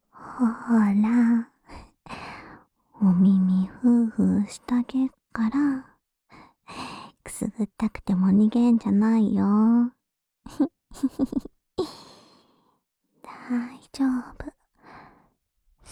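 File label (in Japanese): 耳吹き（右）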